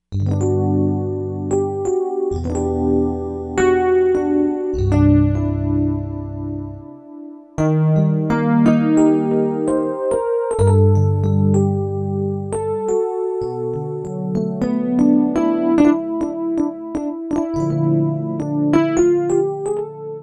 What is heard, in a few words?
Rhodes